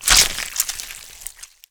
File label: gibbing